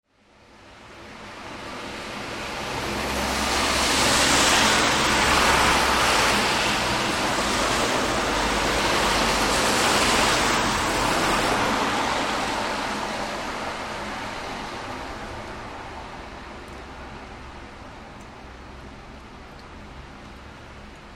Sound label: Traffic Passing Close on Wet Slushy Road; Traffic and Cars